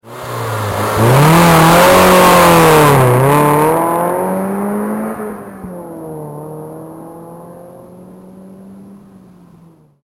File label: miata